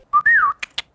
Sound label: Whistle LOUDER